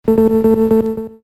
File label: Electric Sound